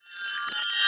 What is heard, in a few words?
reverse tinkle smash glass